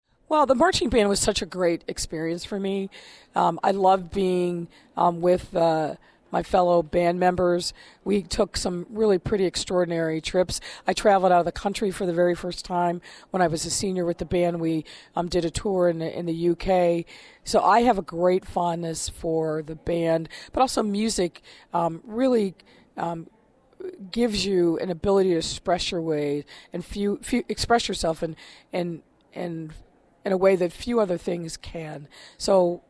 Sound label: marching band; lori lightfoot